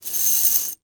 Horror Scary Chain Metal Chains Dragging On Cement